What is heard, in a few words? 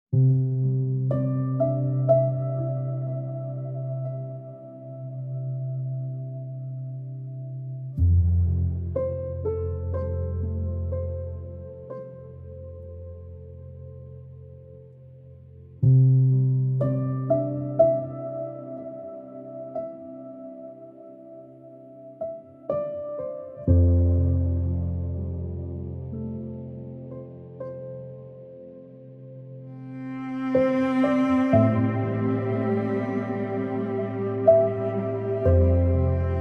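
instrumental piano sad; Ghamgin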